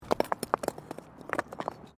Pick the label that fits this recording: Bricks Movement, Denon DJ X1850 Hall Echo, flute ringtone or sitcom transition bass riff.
Bricks Movement